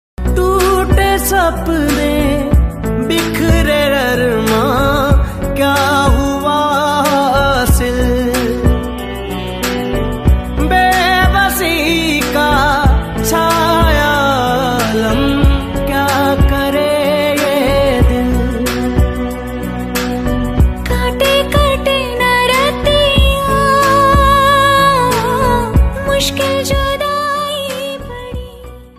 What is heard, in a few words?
Heart Broken Sad Song Ringtone